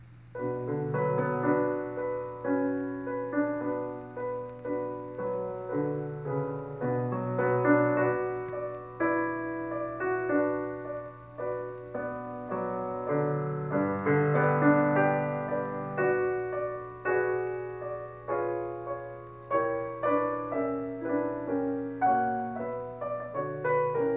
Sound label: Prelude in B minor; Frédéric Chopin